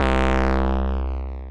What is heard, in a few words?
Analog Bass; Ableton; Retro Synths; Analog Bass Vol 1